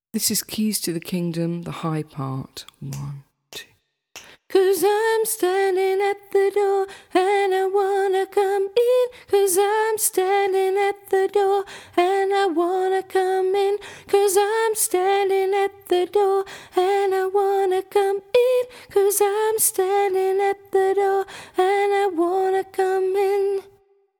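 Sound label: keys to the kingdom Hi, gospel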